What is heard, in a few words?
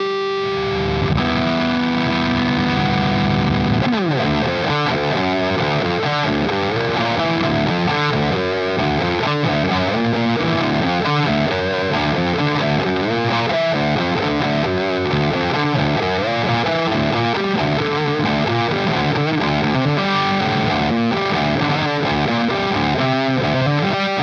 Fuzz Stacked A B